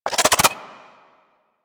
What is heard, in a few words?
Arch Dual Pistol Reload Mech; Weapons; Warframe; Archwing